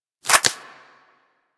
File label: Peculiar Mod Flower; Warframe; Mods; Flower Power